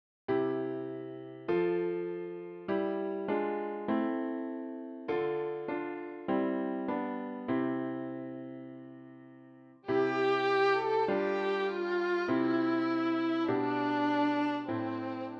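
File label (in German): Violine; Alle Jahre wieder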